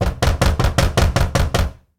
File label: door knock